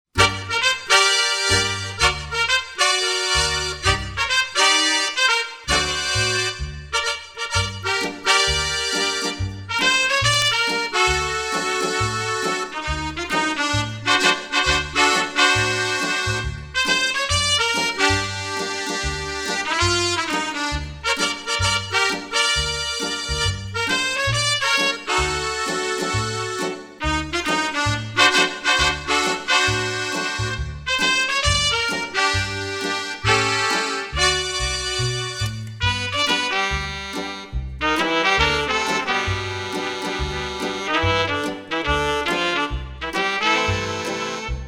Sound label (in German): marsch